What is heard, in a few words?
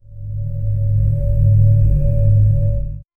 a surging dark energy hum; peak